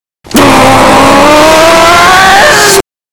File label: growling; patchy